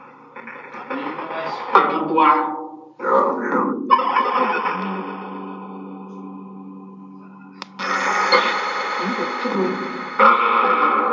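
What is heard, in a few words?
Train Station Phasma Box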